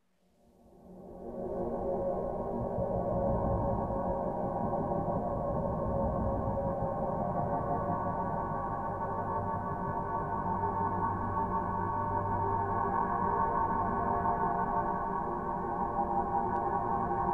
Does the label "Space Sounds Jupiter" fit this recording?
Yes